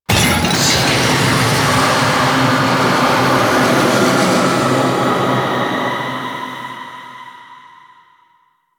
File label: Crate Destroy; Warframe; Entrati; Vaults